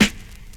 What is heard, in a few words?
lofi snare